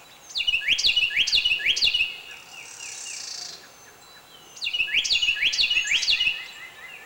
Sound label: carolina wren